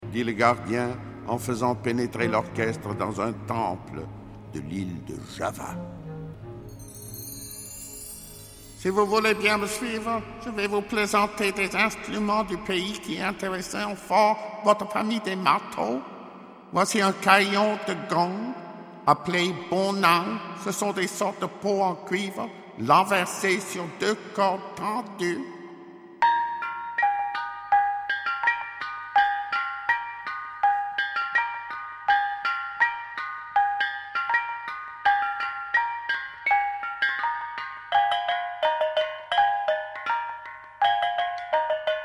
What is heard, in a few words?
java bonang gender; passeport piccolo